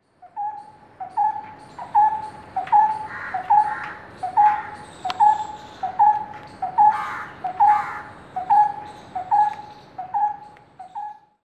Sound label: brown headed barbet; birds